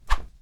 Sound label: Movimiento Ataque Attack Movement